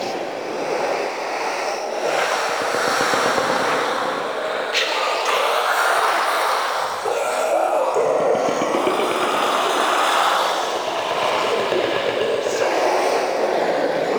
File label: Male Vocal Ghost Loop; Characters; Enemy; Vocals; Mockeries